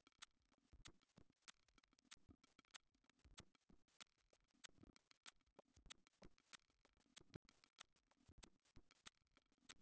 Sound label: wdrums inst src; SiSEC11; underdetermined